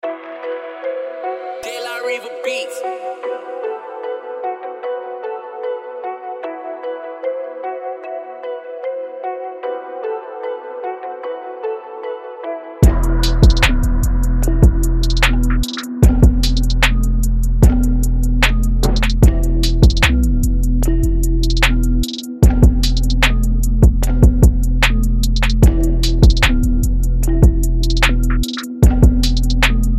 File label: Hip Hop Beat